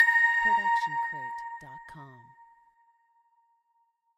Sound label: tonal blip; soundscrate